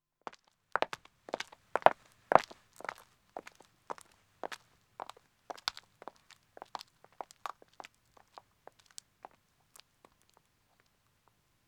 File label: Country Road Walking 2 women; Footsteps; BBC Sound Effects Library